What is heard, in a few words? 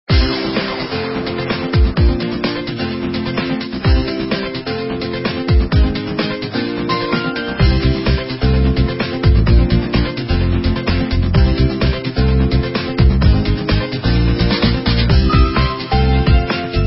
easy run soundtrack